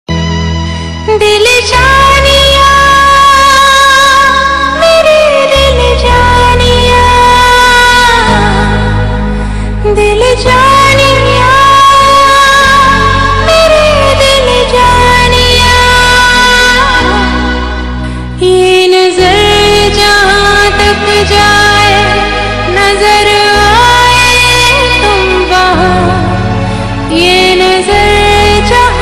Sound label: Female; Tv Serials; Star Plus; Bidaai